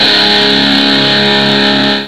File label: DIST GUITAR; Rubber Chicken Software Sample Bank